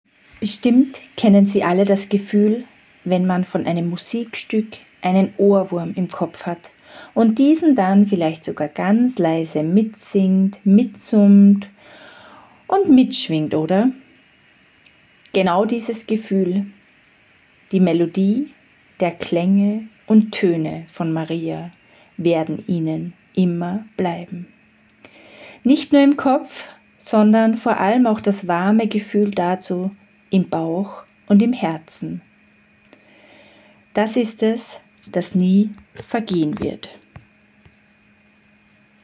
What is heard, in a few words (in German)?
Melodie Ohrwurm